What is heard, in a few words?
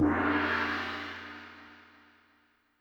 Gong; YAMAHA RM1X